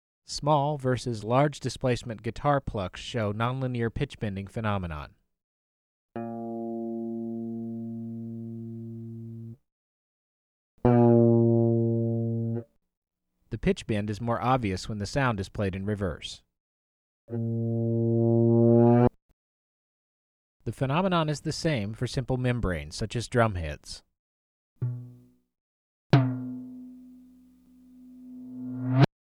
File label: Nonlinearity in Guitars Drums